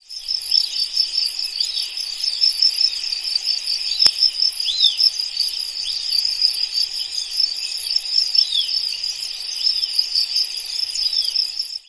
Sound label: crickets synth